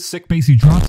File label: bassy drums